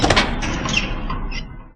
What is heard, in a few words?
door open; svencoop